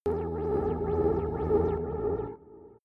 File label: space hover shuttle; raumschiff